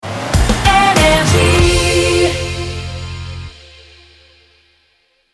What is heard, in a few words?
Jingle Rapid Fire; NRJ